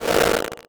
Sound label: Title Transition Distortion; Production Element Title Transition